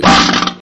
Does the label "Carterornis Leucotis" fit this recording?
No